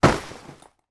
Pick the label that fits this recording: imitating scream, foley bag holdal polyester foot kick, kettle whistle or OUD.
foley bag holdal polyester foot kick